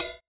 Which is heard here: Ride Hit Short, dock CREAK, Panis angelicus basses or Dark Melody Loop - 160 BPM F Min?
Ride Hit Short